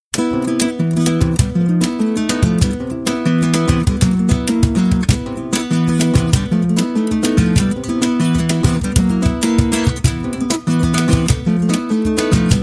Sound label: SAMBA CRIOLO